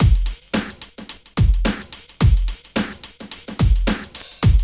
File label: DRUM LP; East West; Phat & Phunky